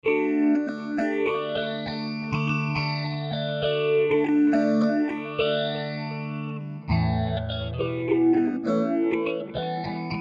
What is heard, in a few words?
Phazer; IK Multimedia iRig HD 2